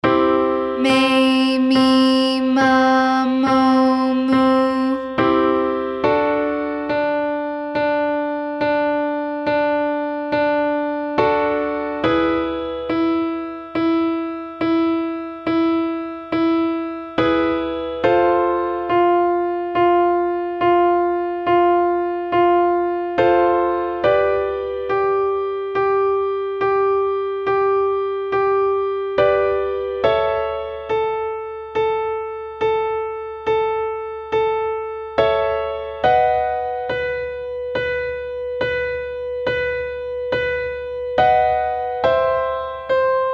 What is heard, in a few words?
Single Note May Me Mah Moh Mo